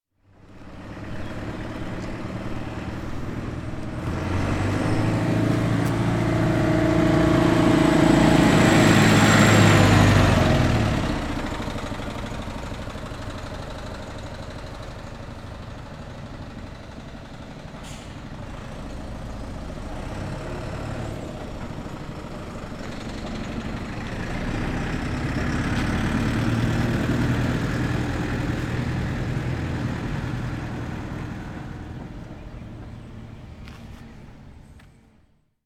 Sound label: Big Truck Around